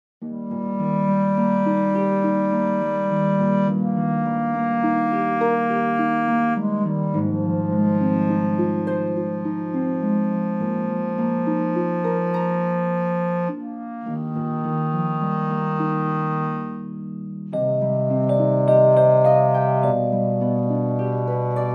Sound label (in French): Instrumental